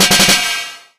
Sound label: killsound percussion